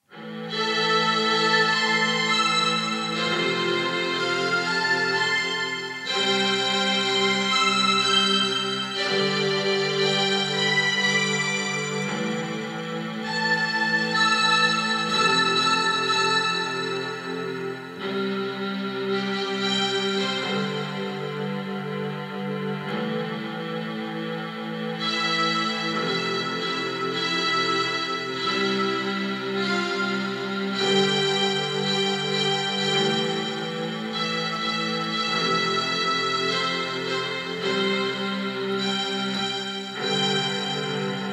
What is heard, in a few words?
MOVIE MUSIC